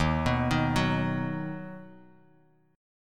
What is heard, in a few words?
D sharp Minor Sharp 5th D sharp down Bass Standard; arpeggiated; D#m#5; JGuitar